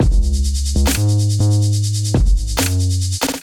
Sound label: Kit groove 152 bpm; MIDI Clips; Dreams Kit; Ableton; Factory Packs; Beat Tools